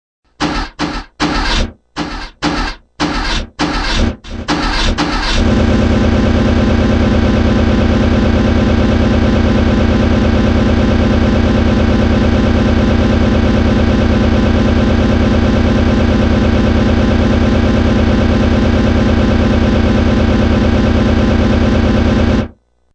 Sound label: A car tries repeatedly to start and finally does.; Car Finally Turns Over; Cars; Vehicles